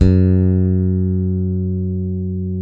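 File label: Sadowsky 5 bass, F#2; Sampleheads; Will Lee Bass Library; SADWSKY5 SUS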